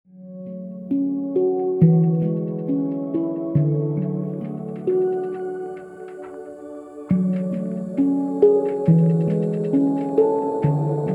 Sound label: mallet